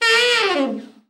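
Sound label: TENOR overblow FALL, Sax; Giga Samples Collection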